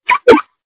car lock ringtone

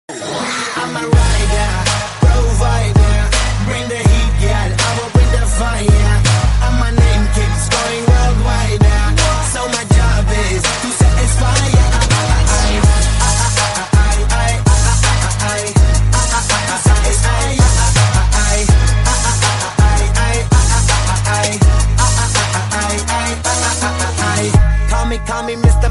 remix song tone ringtone

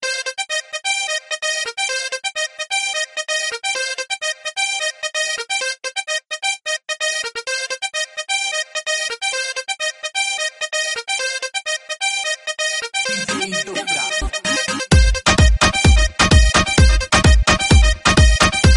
base latin remix ringtone

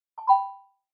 oppo error ringtone

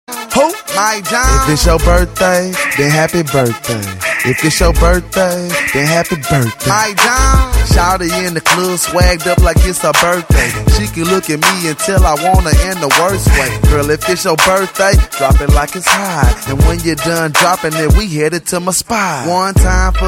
happy birthday ringtone

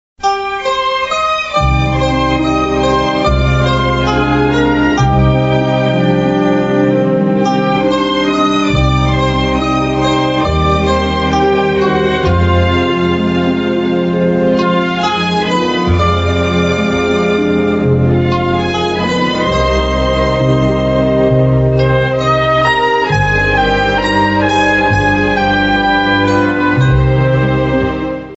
classical ringtone; godfather

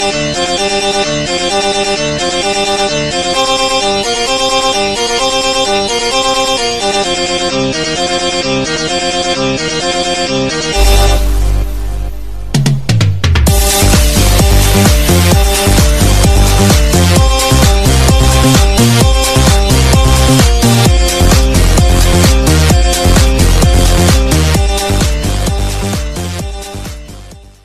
english ringtone; pubg

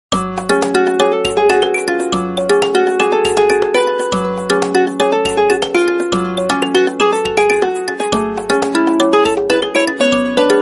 refreshing spanish background music tone ringtone

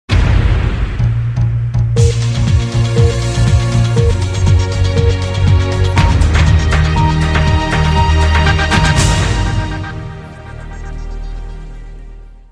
news sms ringtone

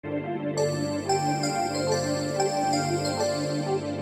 cool ringtone

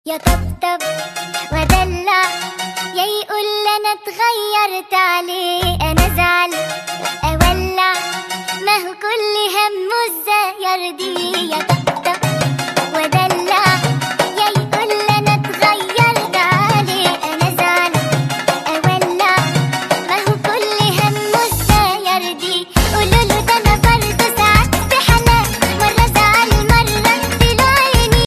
arabic song ringtone

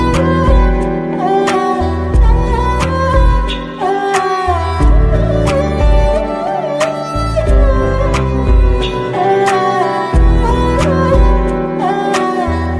instrumental ringtone, bollywood

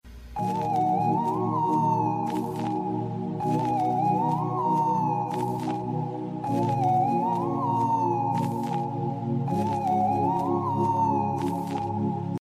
iphone music ringtone

